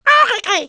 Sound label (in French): bruit; MOBICLIC37